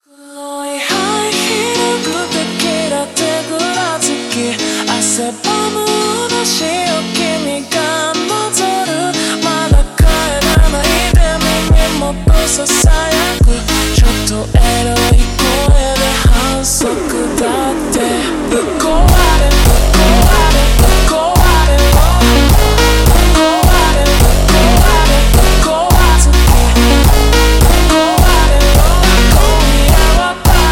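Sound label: super Slowed, phonk music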